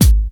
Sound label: original kick drum sample g sharp key